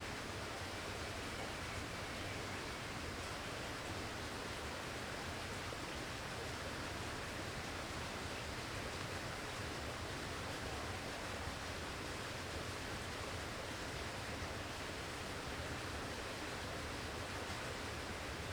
Riacho com alguns estalos e passaros

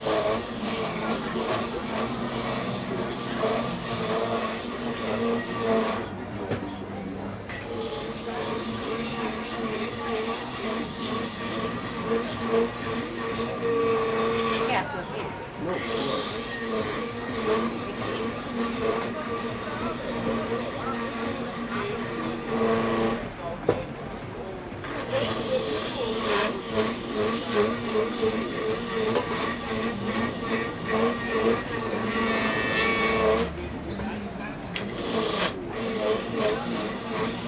Espremedor de Laranja em Banca Comercial; maquinas

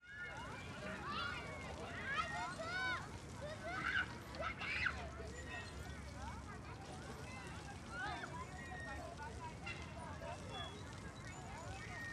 Chuveiro Publico do Parque Ana Lidia; Cerrado

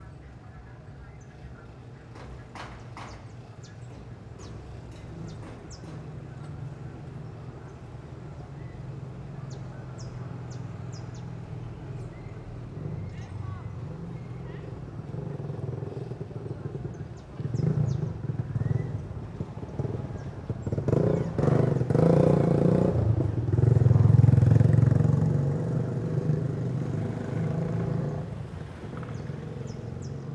Marteladas e moto indo embora; maquinas; canais-stereo; colecao sonora do cerrado

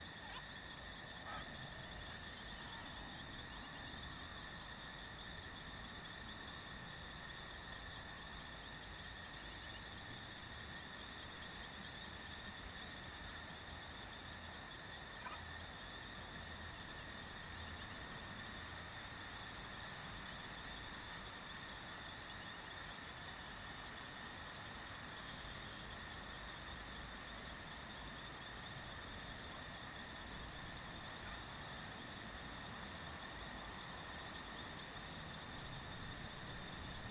Começando a chover no descampado cigarras; tarde

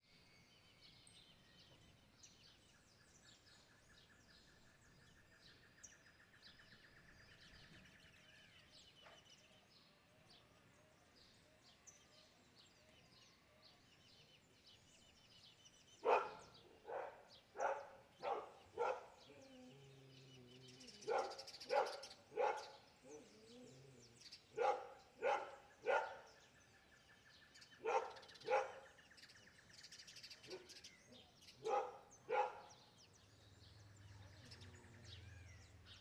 Cachorro latindo em fazenda; animais

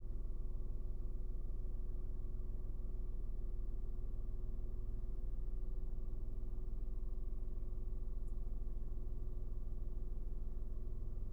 Ambiencia Interna Carro Ligado e Ar-Condicionado Baixo; maquinas

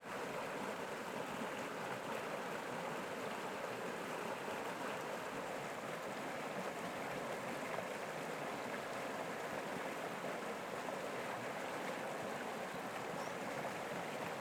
Rio perto passaro isolado mata; agua

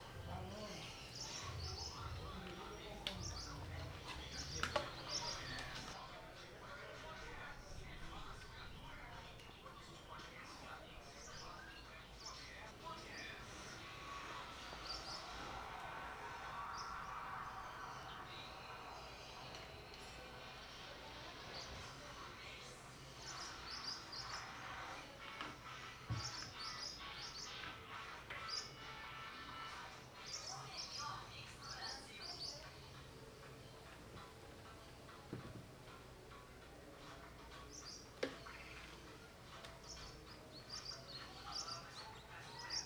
Ambiente em vila com radio ligado; ambiencia domestica

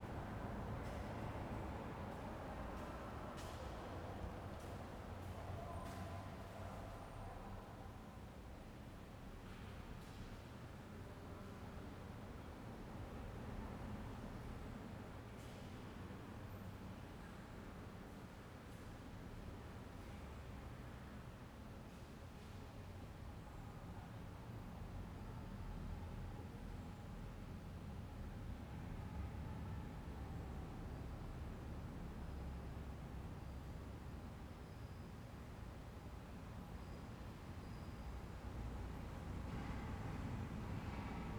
Ambiencia igreja com pessoas ao longe e passos; colecao sonora do cerrado

